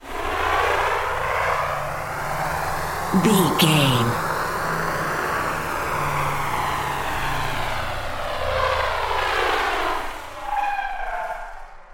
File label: ominous war plane heard courtyard